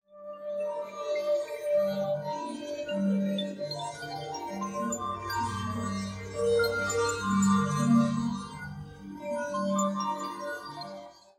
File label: Chimes